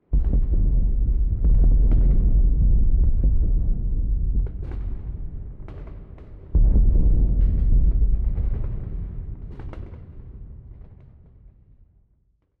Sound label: small explosions; Stellaris; strange worlds